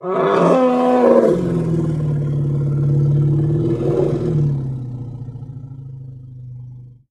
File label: Elephant Trumpet